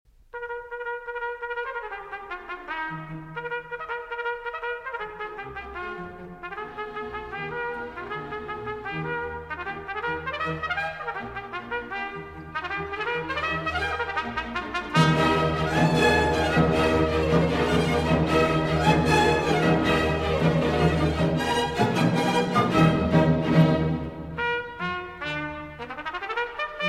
Trumpet Concerto in E Major, E-Flat Major Version, Allegro; Hummel; WoO 1, S. 49; III. Allegro molto